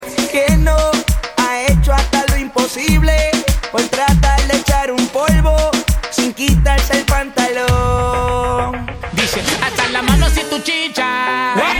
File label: reggaeton